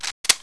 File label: reload